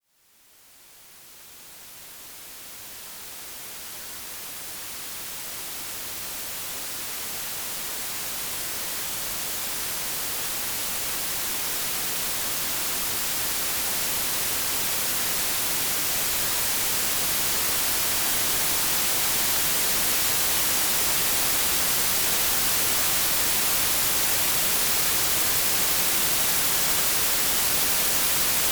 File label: White Noise, Relaxation; RobinHood's AS Sessions